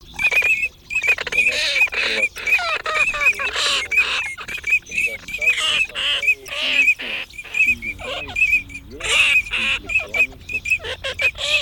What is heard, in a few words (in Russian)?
Чирок-свистунок микс Alzavola mix; plurifon